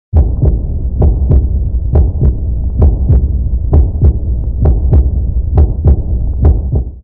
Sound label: heartbeat; Quake